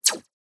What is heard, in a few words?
science fiction laser gun slinky